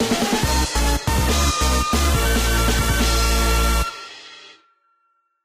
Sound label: fanfale intro; Cave Story Repainted